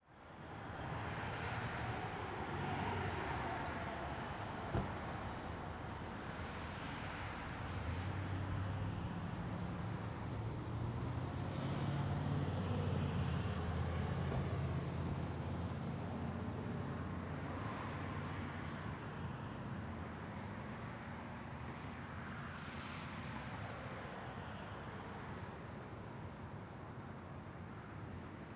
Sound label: Ambience Parking Lot Near Road; AdobeCC